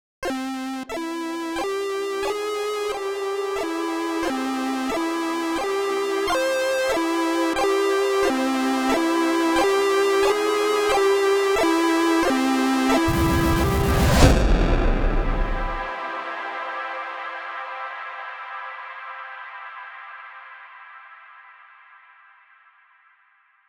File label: 8Bit